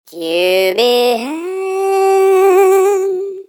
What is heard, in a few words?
jingle